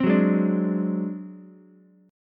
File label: Ab 7th Flat 5th Ukulele, chord; Ab7b5 strummed; JGuitar